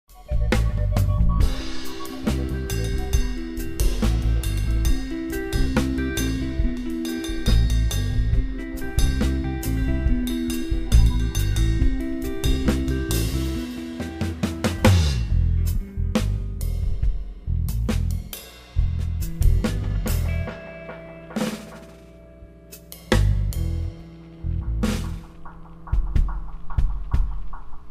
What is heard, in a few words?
Rubber Dub; MoodDocs